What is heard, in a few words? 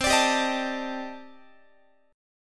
C Minor 6th Add 9th C Guitar; Cm6add9 strummed; x,20,17,17,19,20